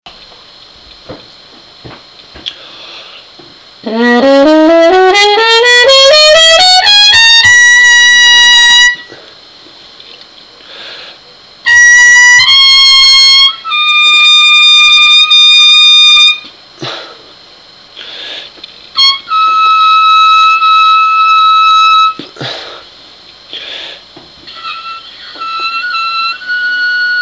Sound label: daempfer c1 c3 bis f3, trumpet